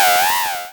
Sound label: Cry